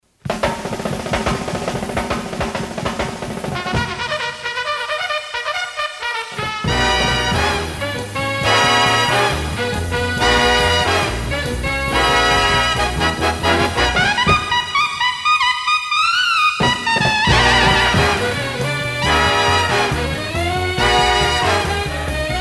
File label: TAP